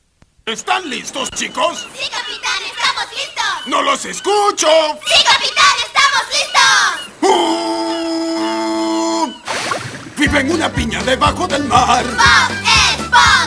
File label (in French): generique espagnol; bob leponge